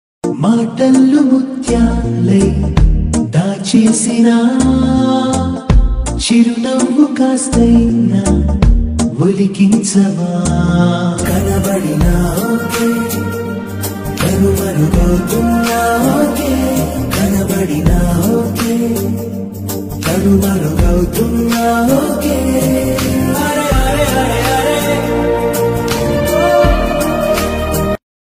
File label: telugu love bgm music